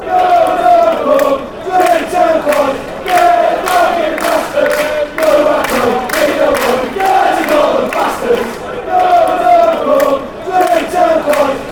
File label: amazing chant